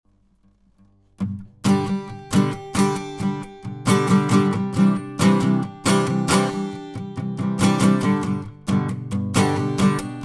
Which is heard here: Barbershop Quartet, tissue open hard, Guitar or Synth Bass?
Guitar